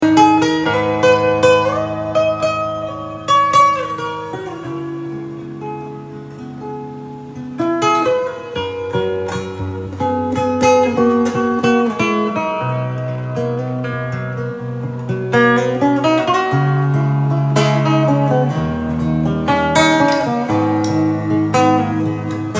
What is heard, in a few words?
Silent Stage Guitar Sound